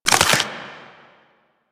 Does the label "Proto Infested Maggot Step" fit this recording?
Yes